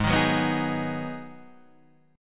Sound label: G sharp Minor 7th, Guitar Half Step Down